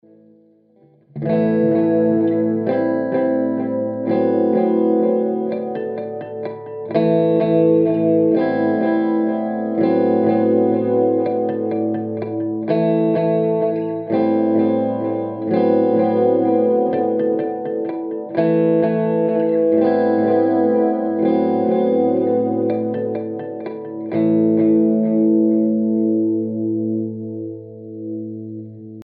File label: harmonics off